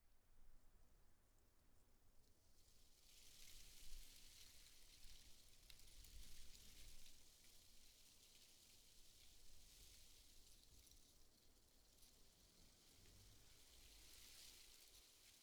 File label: leaves, MidDay; FontainebleauDemo